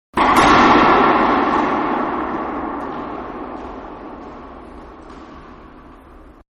Racquetball Beginning of play, Ball Hitting Wall

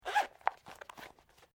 Pouch Small Zip Open; small bag; Zippers; Objects

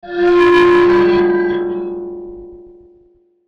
Metallic Dirty Feedback Ring Swell; Metallic Sound; Metallic Sound Sound Effects; Production Element Imaging Element Accent Transition

